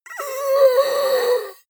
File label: monster inhale; kreaturen